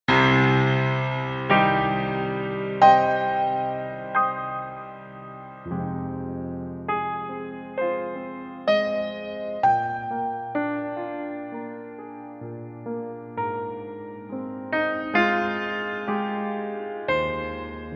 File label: Combinator Concert Grand Piano, Reason 3.0; Propellerhead Reason 3.0